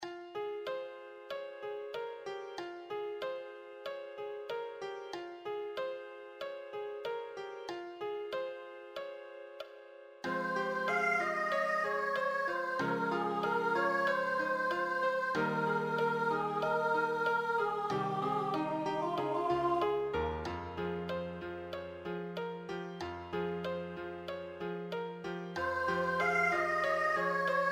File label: accompaniment